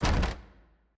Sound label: Door Locked